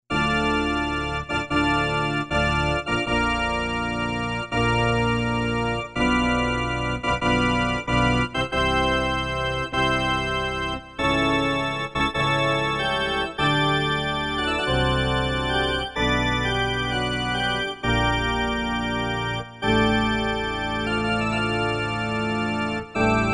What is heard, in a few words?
Organ 3, Casio CSM-1; Tones